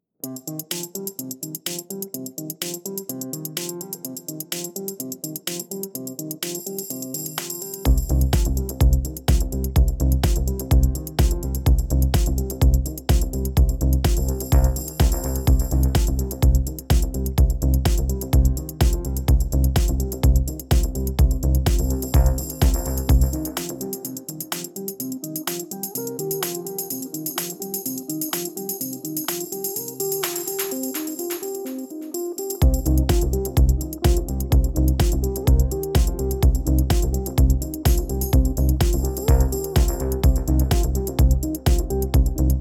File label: DEEP TECH GUITAR